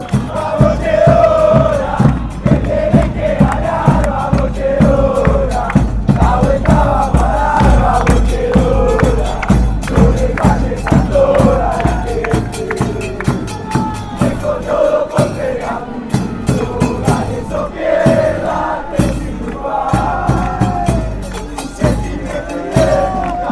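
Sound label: football fan chant; douglas haig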